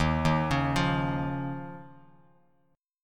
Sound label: D sharp 7th Suspended 2nd, Bass Standard; arpeggiated; JGuitar